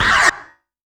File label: Yamaha CS1x Drums